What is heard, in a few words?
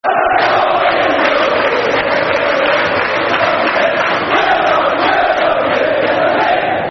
Ei, Ei, Ei, O, Football Chants; Derby County Dcfc